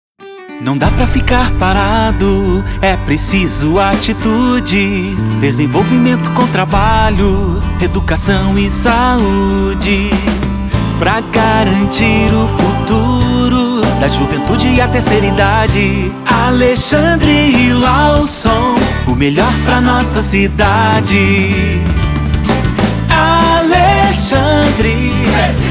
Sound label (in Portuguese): politico Jingle